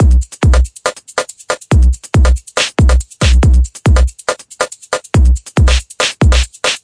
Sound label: 140 bpm gnarly break tsshh tsshh